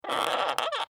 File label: Wood Creak Squeak, Old, Handle; Foley; Squeak Sound Effects; Squeaks (Sound Effects:Objects); BlastWave